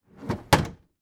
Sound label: household bin trash under sink slide close; zapsplat